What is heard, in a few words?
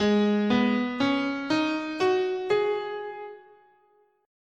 Ab Minor Pentatonic, scale